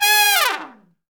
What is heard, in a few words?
G#3, Section Horns